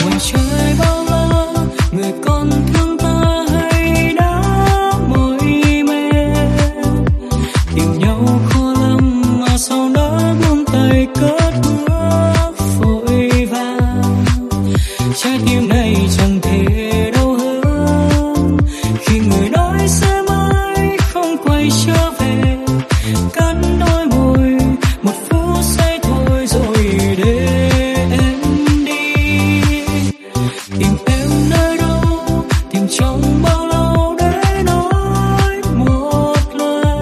Remix; nhac chuong

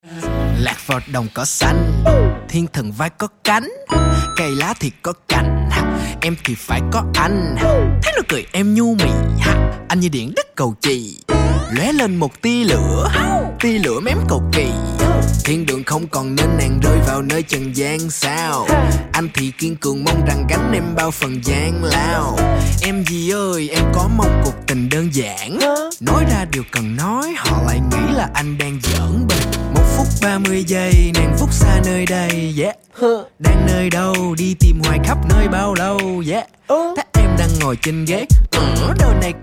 Rap; nhac chuong

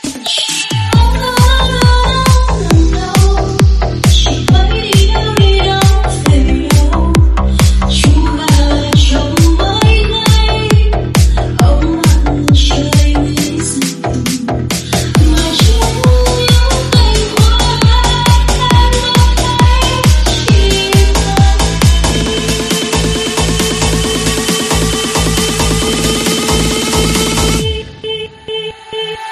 Remix; nhac chuong

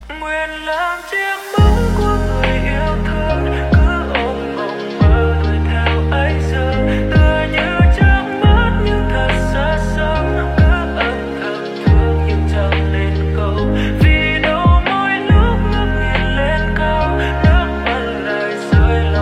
lofi

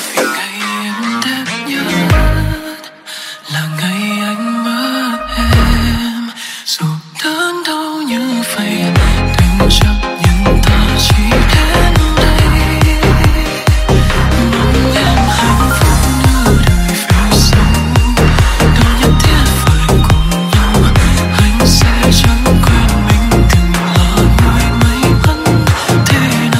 Remix

